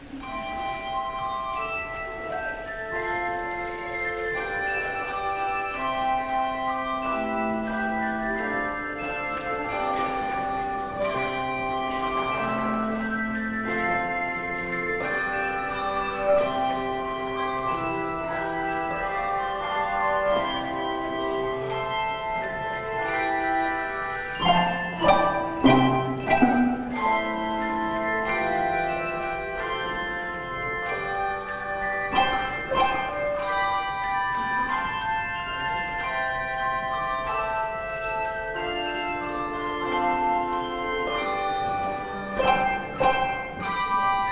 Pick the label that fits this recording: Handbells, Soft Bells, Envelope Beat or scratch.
Handbells